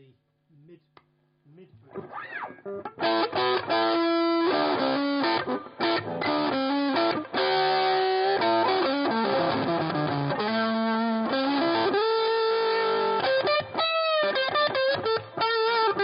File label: lead mid boost